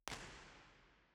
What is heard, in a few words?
First IR Sala 2800 Genelec Left BIN, Binaural; Santa Cecilia Roma; Measurements 2005; Soundfield